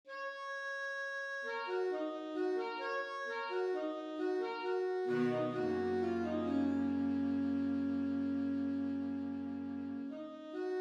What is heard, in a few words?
La fille aux cheveux de lin for sax quartet